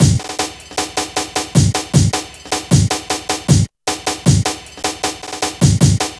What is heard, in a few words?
Hard Step, Drum Loops, Jungle, 155; Jungle Warfare 1&2